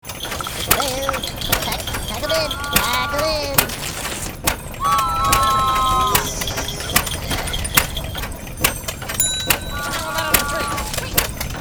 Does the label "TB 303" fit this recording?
No